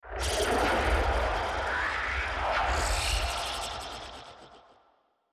Power Ups Energy

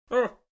ow

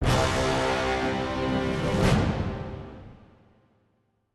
Superhero Stinger; little big planet playstation vita

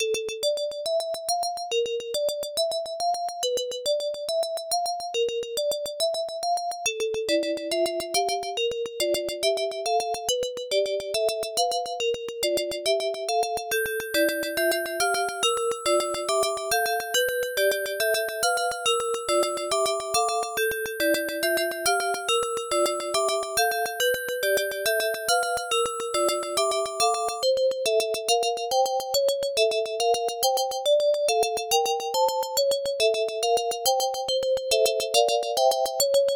Staff Roll (Ending); Spinal Breakers; Arcade

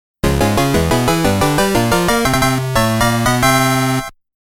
Start Demo; Exed Exes; Capcom Music Collection Vol.1

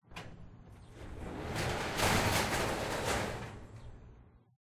Garage Door Close; hello neighbor pre alpha alpha 1; windows

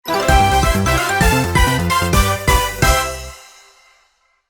Minigame Victory; Mario Party 7

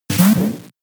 In-Battle Recall Switch Pokeball; pokemon; gen 3; attack moves

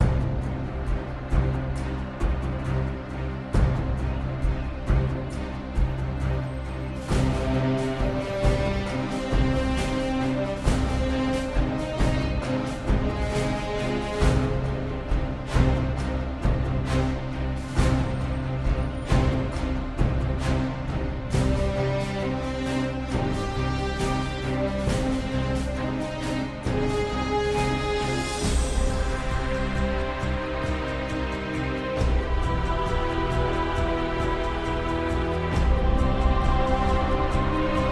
City Jazz; Transformers The Game

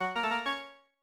Comical Organ

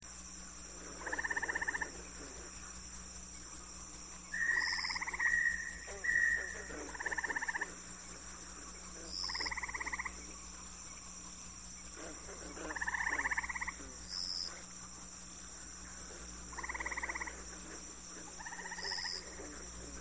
Ambient - Night; bad piggies; android; mobile; windows

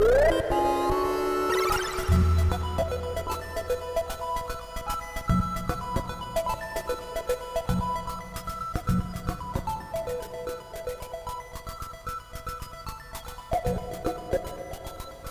Jingle Clear!; Dr. Mario

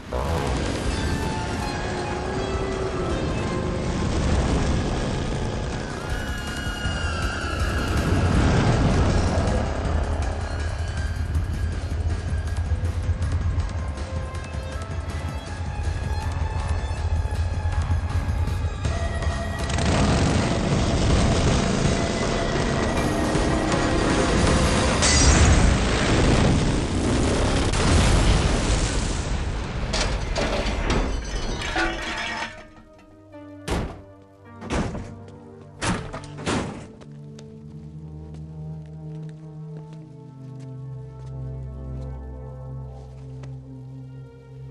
boss death

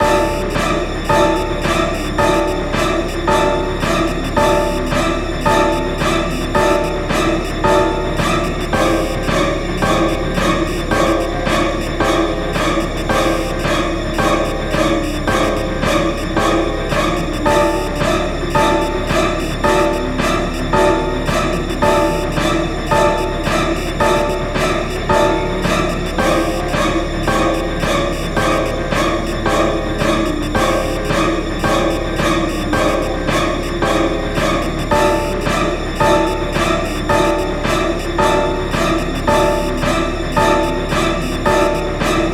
Death; Broken Delusion OST 2020

